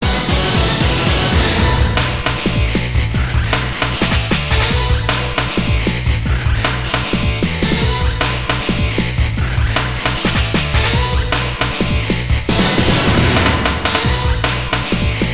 Power Up!; Dragon Ball Z: Idainaru Dragon Ball Densetsu; Dragon Ball Z: The Legend; Saturn

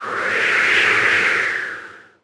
Whirlwind; New The Denpa Men

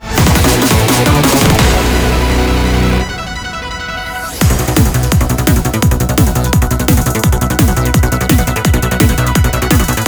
Victory STAGE CLEAR; Gunvein

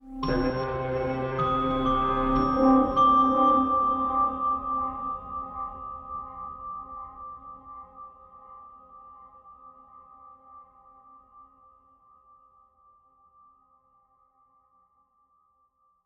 Boot Sound; year walk wii u gamerip 2015

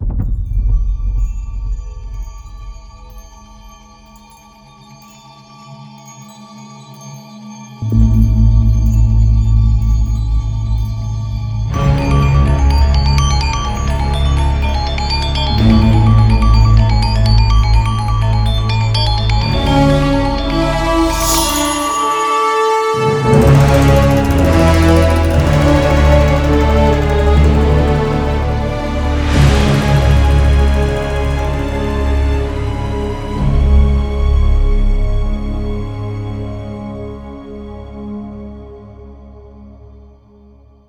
Gamelan; Toshi's Fear; Kena Bridge of Spirits

